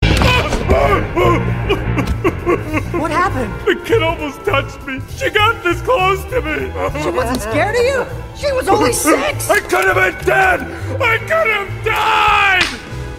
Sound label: crying